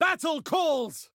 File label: elves battle calls